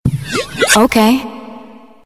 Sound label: text sms, rock